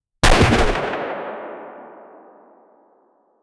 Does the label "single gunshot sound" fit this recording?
Yes